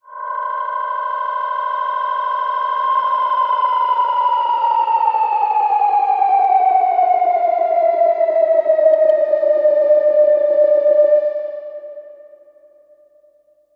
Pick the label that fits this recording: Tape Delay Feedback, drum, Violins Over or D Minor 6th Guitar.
Tape Delay Feedback